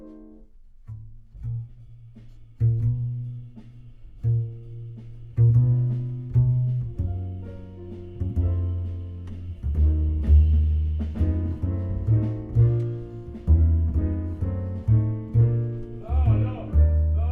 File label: Bass Bot